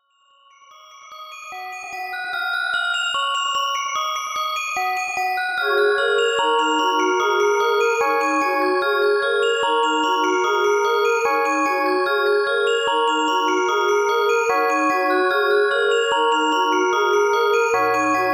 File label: string 148bpm